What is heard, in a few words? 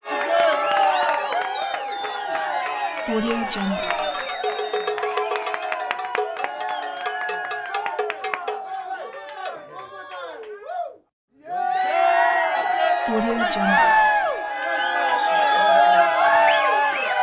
party walla constant cheering